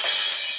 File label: classic hip hop Ohh; STYLE KITS (Hip Hop, Etc); Classic Hip Hop Mega Kit